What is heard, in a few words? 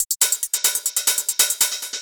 Glitched Hat